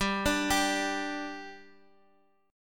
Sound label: G 5th G Guitar Half Step Down, chord